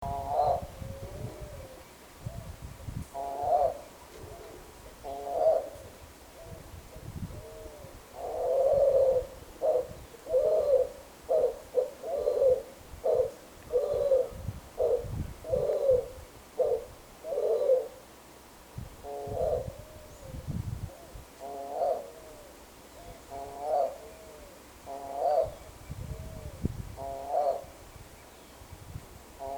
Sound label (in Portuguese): canto da pomba do orvalho